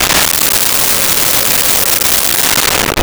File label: Crowd Boo Medium, Vocals & Wallas; Crowd Boo Medium 01; SONY PICTURES SOUND EFFECTS SERIES